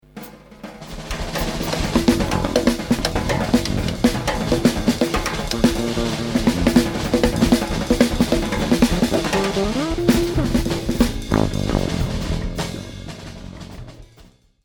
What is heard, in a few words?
drummy